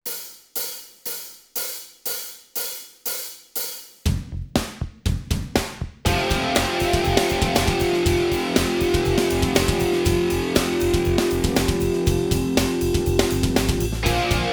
Heavy Metal Backing

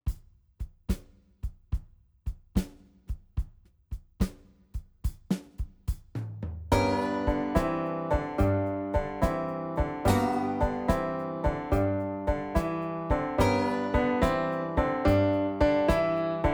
Country Blues

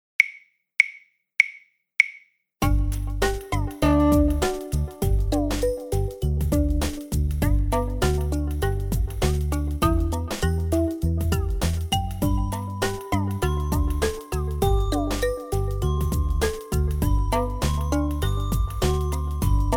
Backing Track

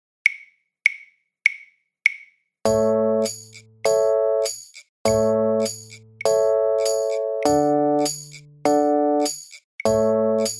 A Tune Backing Track

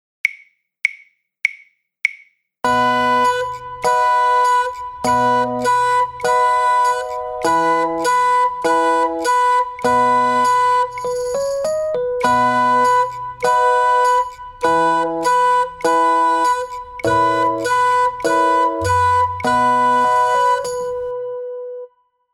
Full Performance

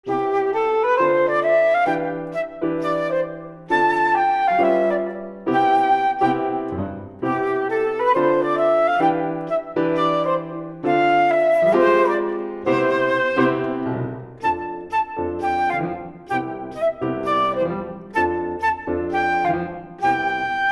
performance, Flute; Tanner; ABRSM

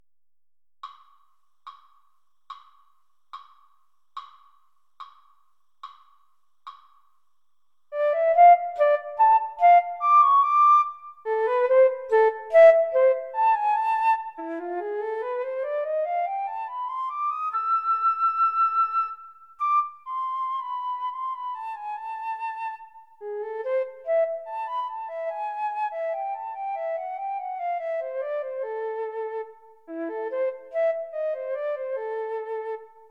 Twisted Tango Flute

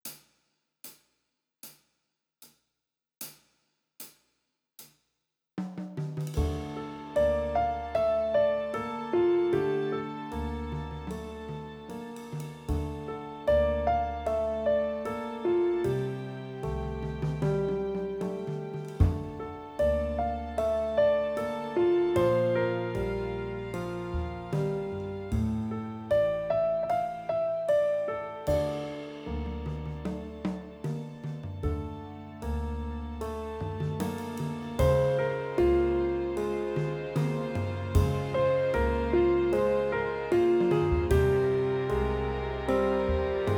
Slow Blues

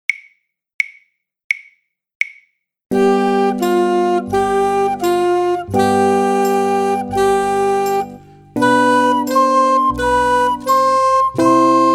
Full Performance

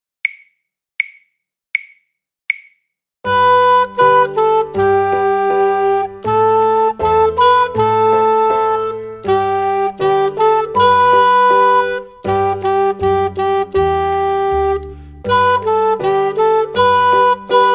Eighth Note Workout Full Performance

